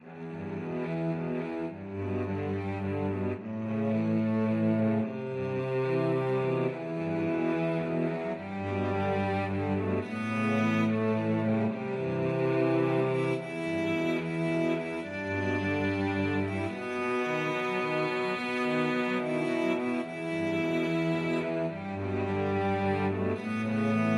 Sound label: house of the rising sun, cello trio